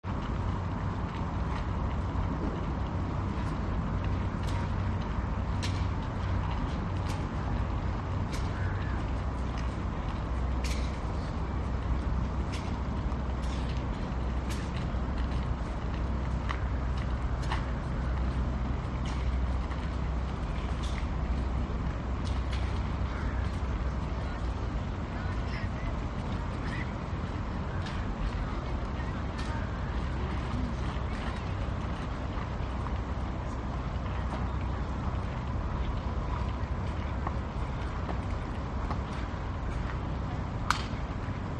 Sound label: stockholm waterfront light promenade walkway footsteps children plane overhead